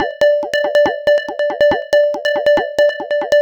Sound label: TECHNO EXTRA 140